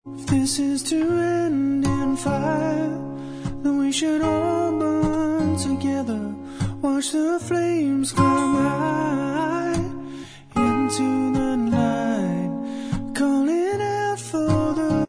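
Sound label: backing vocals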